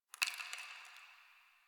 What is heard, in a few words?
Ice Crunch; Ambience; Warframe; NineteenNinetyNine